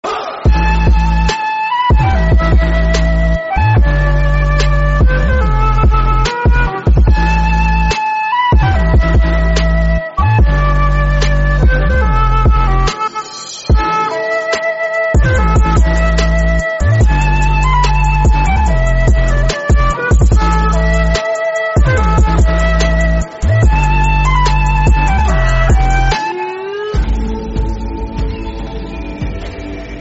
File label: Dubstep; Naruto Theme